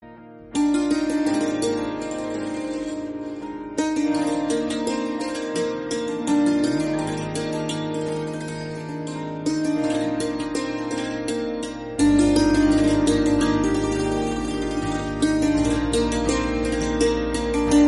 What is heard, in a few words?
Instrumental; Yeh Jawaani Hai Deewani